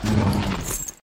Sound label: hit